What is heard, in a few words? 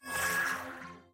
holo sound